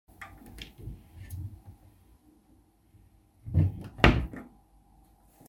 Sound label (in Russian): dverca vitriny v gostinnoj s bronzovoj ruchkoj